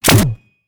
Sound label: future weapons venomspitter mark ii shot; future weapons 3; soundmorph